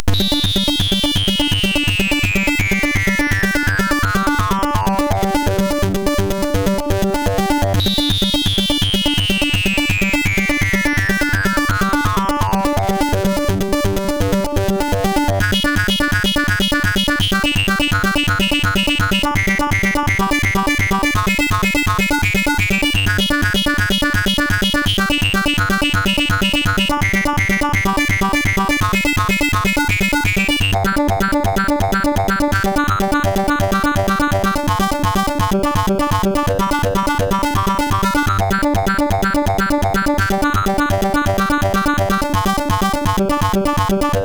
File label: Clean Ring, sid CSG8580R5; Morton Adam